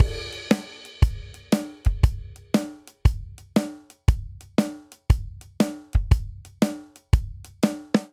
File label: Blues Shuffle Verse 118 bpm, Drum; 4 4; MIDI Clips; Ableton; Factory Packs; Drum Booth